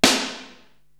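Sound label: snare drum, Drum Machines, Korg TR-Rack; 300 Drum Machines; Yowie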